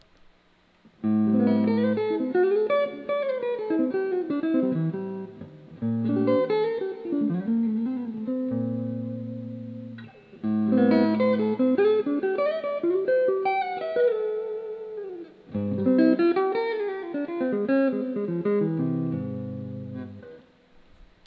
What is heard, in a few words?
fat man tele neck, pickups